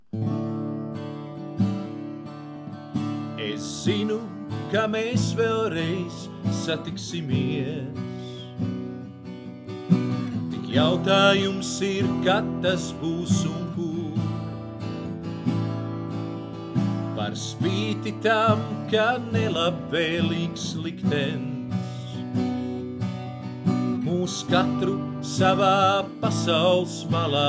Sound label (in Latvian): ar gitaru; Gauja; Musu milestiba